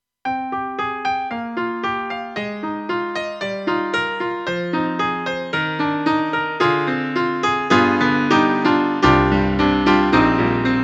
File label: COMBO PIANO; AKAI